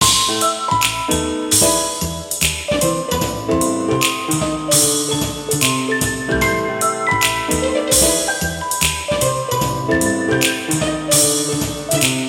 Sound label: Cat Walking, ringtones